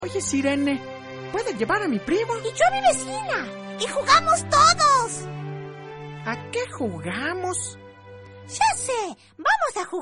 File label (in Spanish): sirene